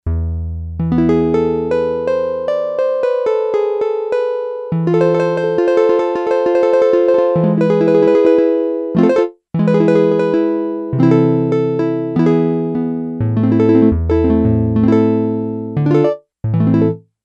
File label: Yamaha DX11 Flamenco; Factory Preset Voices